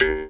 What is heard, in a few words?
jaw, Didgeridoo and Other Primitive Instruments; Big Fish Audio - Didgeridoo and Other Primitive Instruments; JAW SIMPLE